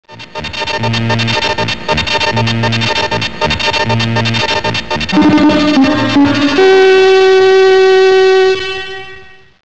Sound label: closing news sounder; American Contemporary Radio Network; ABC